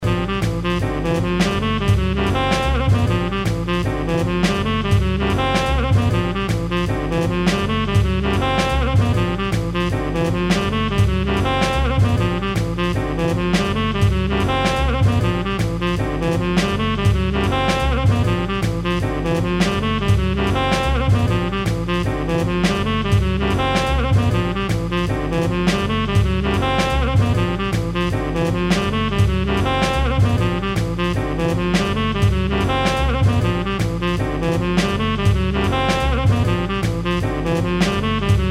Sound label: Loop; Dexter